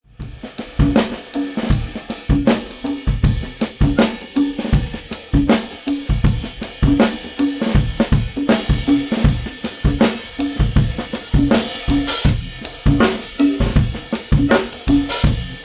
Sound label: Overhead clean; Steinberg SpectraLayers Pro 10; RipX